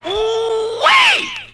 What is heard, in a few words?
"ooh wee" fake